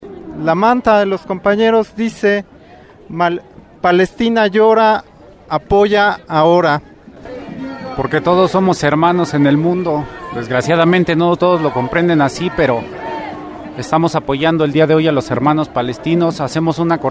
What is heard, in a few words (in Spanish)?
marcha; palestina julio 2014